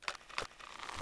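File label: crossbow reload string start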